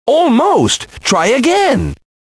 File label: Lose Game; kids-tetris; Haunted